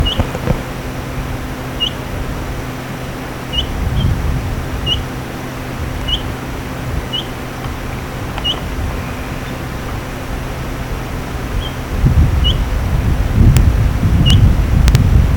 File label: blackcowled oriole, Belize birds birdsongs